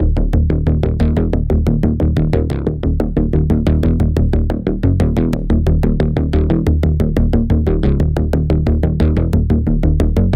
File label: Pulse